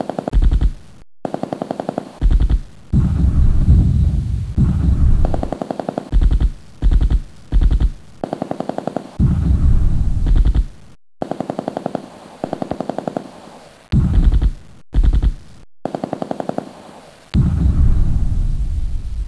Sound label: skirmish; De dust2 Buildings; cstrike